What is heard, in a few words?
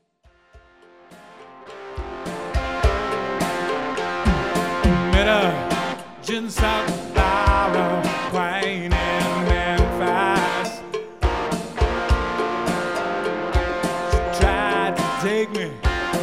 Honky Tonk